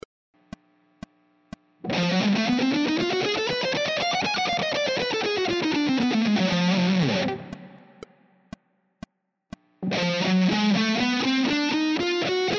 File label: outside lines